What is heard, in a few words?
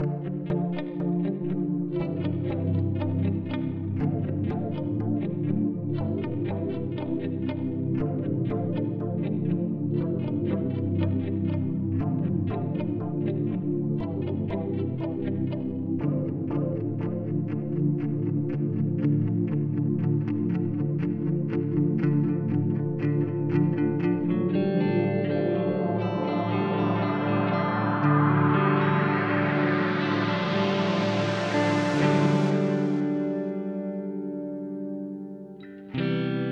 Bow